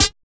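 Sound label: QUIRKY snare; Drums In Your FACE!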